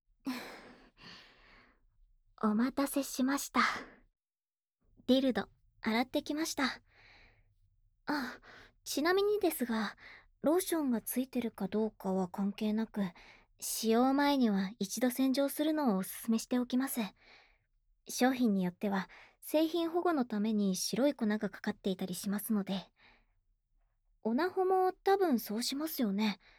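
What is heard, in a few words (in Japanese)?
咥え心地（フェラ）; 次は、咥え心地を見ていきましょう; 最新エログッズを、淡々とレビューしていきます; ピンクスタチューS; エロ配信者の江口さん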